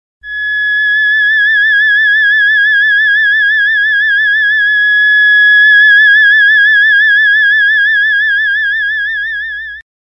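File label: theremin note G sharp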